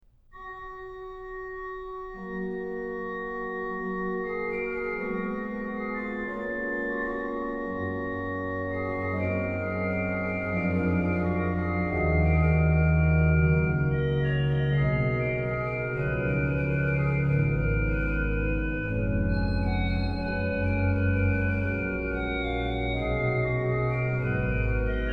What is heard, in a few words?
ORGAN, Chorale Preludes; BWV0641; Wenn wir in hochsten Noten sein; BACH; BACH ORGAN WORKS